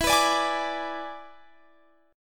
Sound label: E 7th E down Guitar Drop D